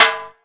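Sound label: 130 jam timbales, Ragga & Dub Reggae; USB Soundscan vol.27; AKAI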